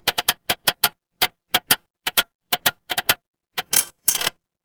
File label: mechanical slot machine